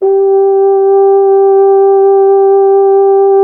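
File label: BRS F.HRN, Solo Instruments, French Horn; Miroslav Vitous; Solo Instruments VOL-2; Solo lnst. II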